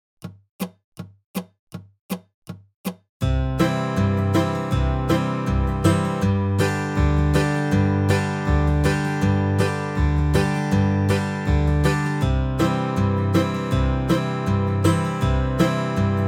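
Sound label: Key of C 80 BPM, Dobro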